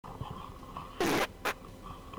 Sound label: wood fart